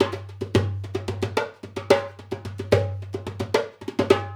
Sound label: Dumbek; Essential Percussion